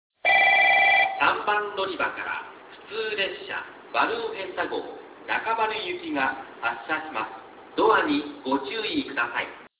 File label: balloon hizen yamaguchi local nakabaru